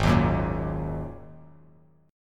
Ab 7th Suspended 2nd Ab; Ab7sus2 strummed; 4,6,4,3

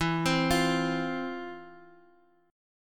F 5th E, chord; F5/E arpeggiated

